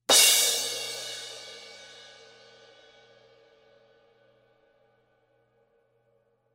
A Avedis Crash

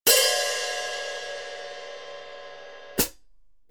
13in HiHats, cymbal sound

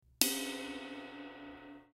K Custom Special Dry Ride Bell, cymbal

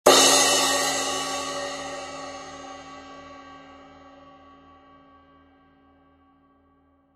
16in Rock Crash, cymbal sound

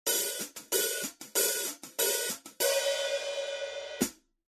13in Custom Dark HiHats, cymbal

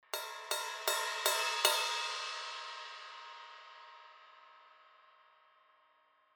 17 I Trash Crash bell hits, cymbal sound

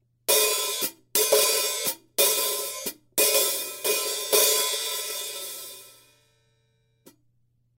S Mastersound Hats Pattern, cymbal

